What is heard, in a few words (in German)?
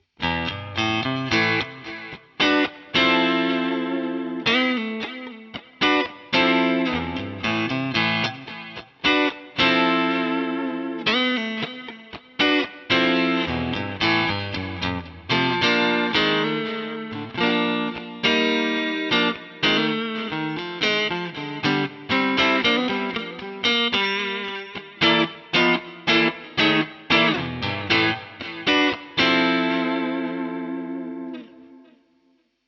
DI Aufnahme S Gear Plug in ohne Comp